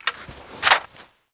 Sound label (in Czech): naradi; Mafie 1